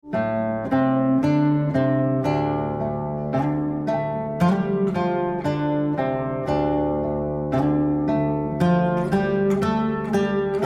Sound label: ponglang